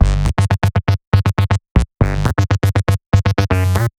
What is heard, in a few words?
Tech Bass 120 G, techno; musicradar; 120bpm; Bassline